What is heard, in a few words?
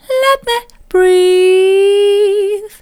let me breathe soft, vocal